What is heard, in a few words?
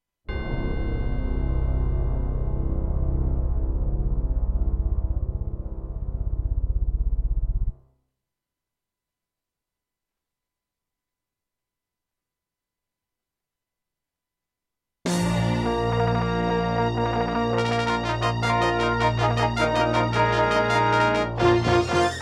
Intro, Ensoniq VIVO 90DB-A; Dark Forces